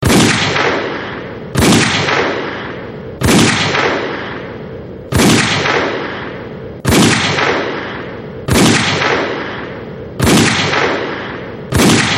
Heavy Gunshots

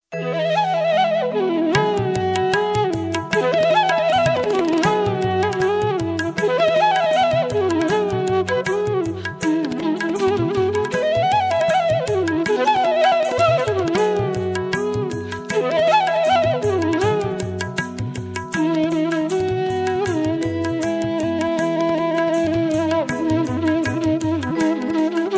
Classical Tabla

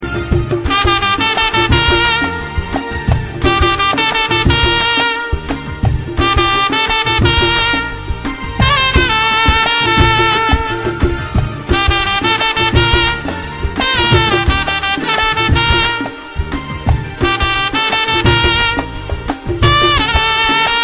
Trumpet

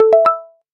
Goal

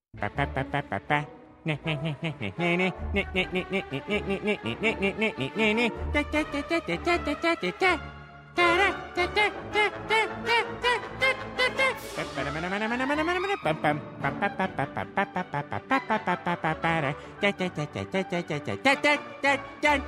Humm

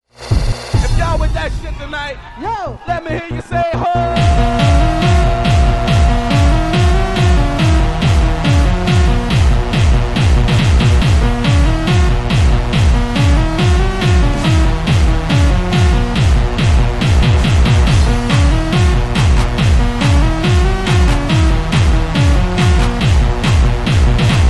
Clubbing

